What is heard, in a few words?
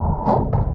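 Scrubbed, hits and bits; Zero-G; Total Drum Bass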